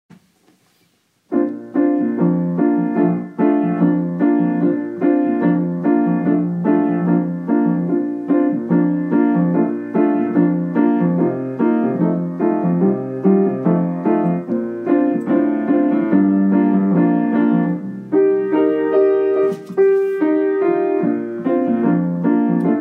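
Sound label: piano accompaniment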